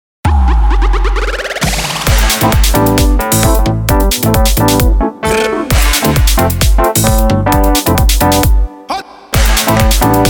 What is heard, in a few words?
e piano